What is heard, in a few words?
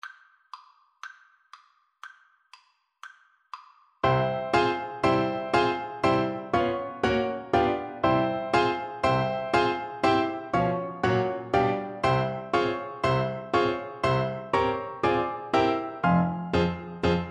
tarantelle, Clarinet